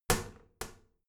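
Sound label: toilet lid wall open heavy, bathroom; haus-garten; badezimmer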